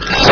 Fix Glass; Scripts & Backflips

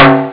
cowbell

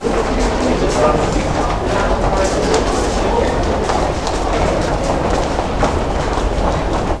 hall; Florida State Football - Ultimate Multimedia Experience

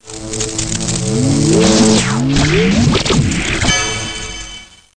Crystal Placement Shorter Softer; Let's Discover Massachusetts

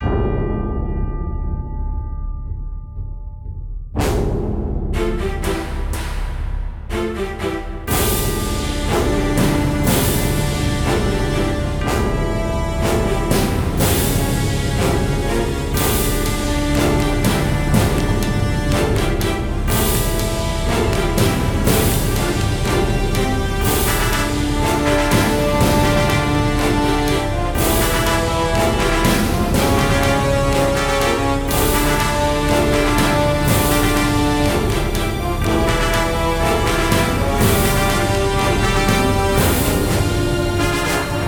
Battle; kult

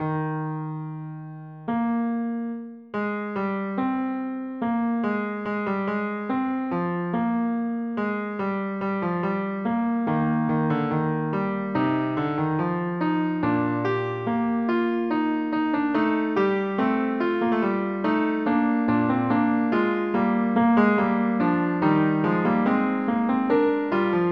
fugue

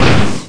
crash; PC Gamer IT CD 31; SegaTC

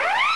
Nuke Near Detonation; Barrack 1.0.0; Barrack Sounds

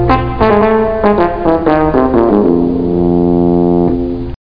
trombone; How Many Bugs in a Box

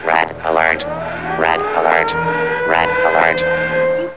red alert

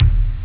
tom, drums; OctaMED SoundStudio V1

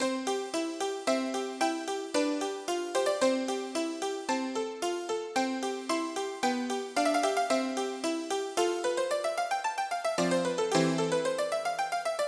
SONATA; BigMax nº 1

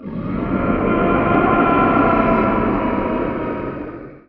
warp loop; PC Gamer IT CD 41; Daikatana

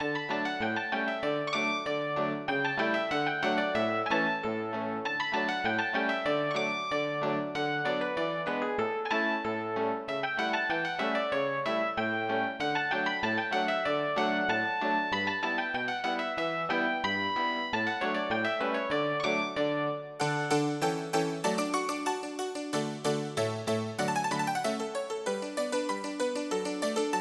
gavotte

